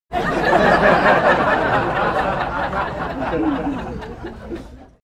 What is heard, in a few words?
audience laughing